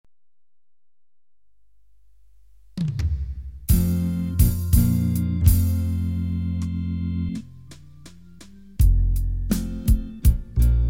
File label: komp 4 chorus 82 bpm